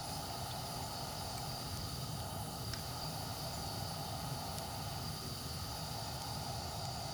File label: zone gas LOOP